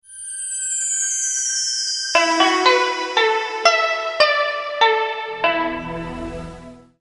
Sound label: Rising Sun Alert Asian Chinese